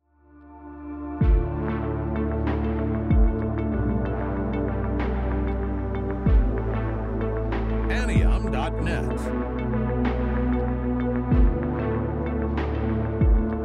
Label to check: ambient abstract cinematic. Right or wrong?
Right